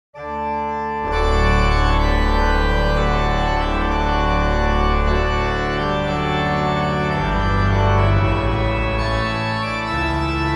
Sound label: Organteq; Magnificat